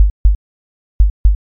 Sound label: heartbeat